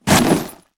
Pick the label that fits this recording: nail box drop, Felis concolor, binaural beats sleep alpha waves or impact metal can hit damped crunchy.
nail box drop